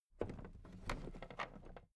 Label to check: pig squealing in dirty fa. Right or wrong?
Wrong